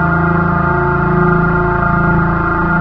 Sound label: rotor machine; source engine; hl1; video games